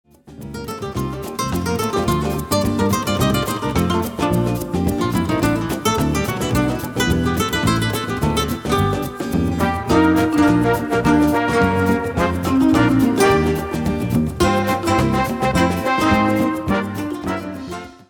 Samba

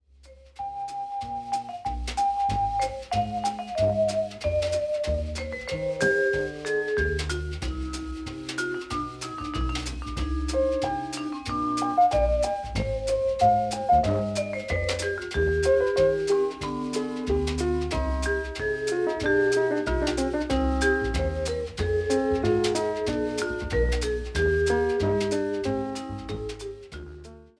Quartet

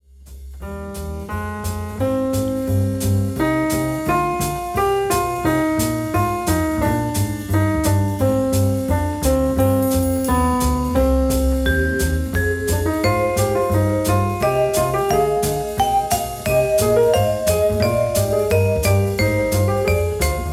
Jazz Quartet

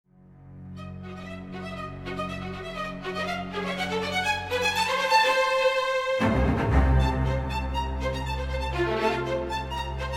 Serenata for Strings, Finale

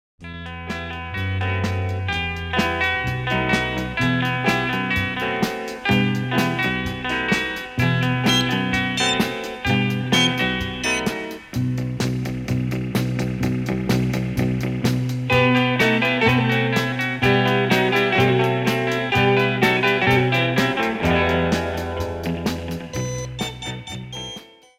Vibrant Guitars

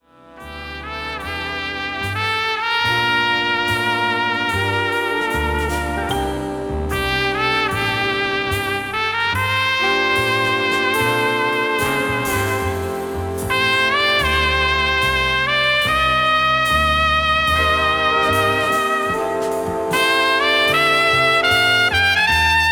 Big Band

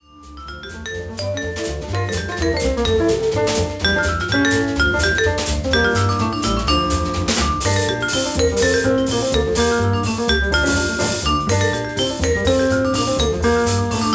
Jazz Quartet

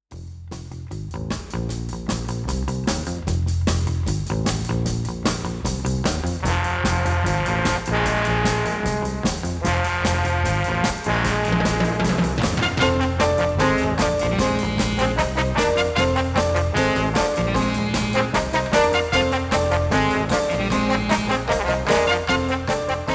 Wild Beat

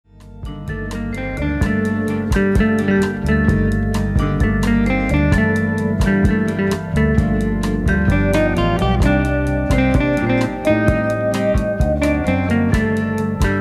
Sounds Latin

